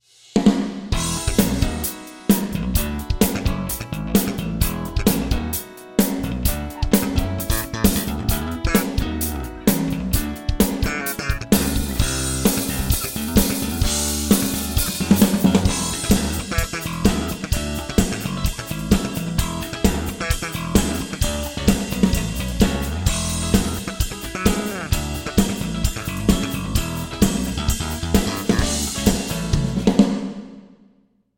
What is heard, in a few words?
Ensoniq DP Pro Drums Band Intimate Hall